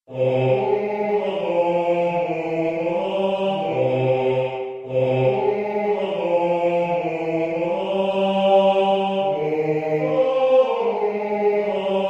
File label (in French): basse; Mesures 67-75 - Voix 2